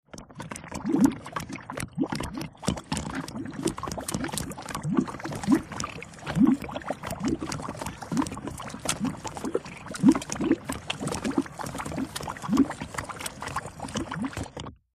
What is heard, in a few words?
Gooey Heavy Bubbling